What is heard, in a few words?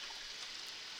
Background Noise Clips